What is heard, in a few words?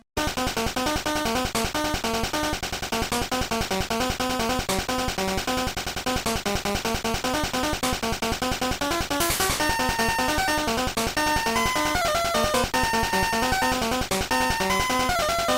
Football In-Game